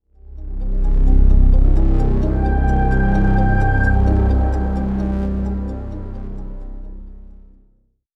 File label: dark logo